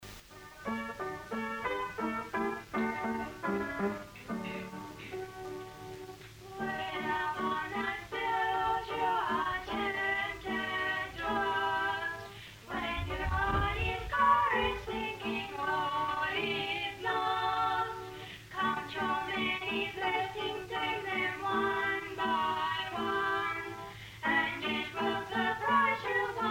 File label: Dad singing